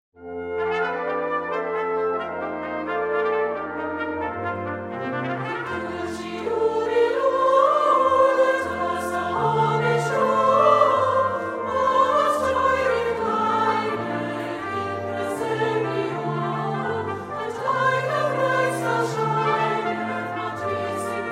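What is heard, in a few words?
in dulci jubilo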